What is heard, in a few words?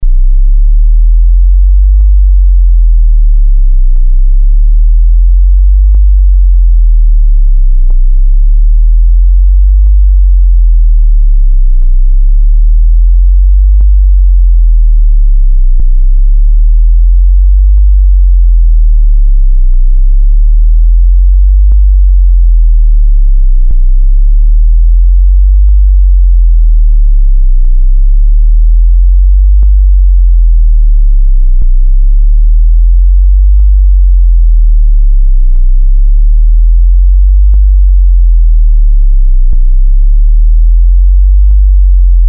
30Hz to 50Hz Medium Warble